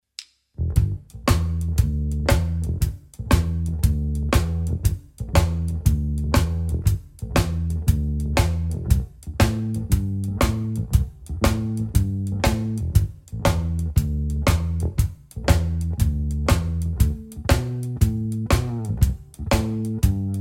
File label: shuffle, bass